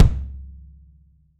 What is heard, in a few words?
kick, 3D Drums; RUBB KICK